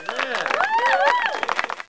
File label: Clappy; Funhouse of Love